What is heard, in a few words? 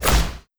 p atk cypunch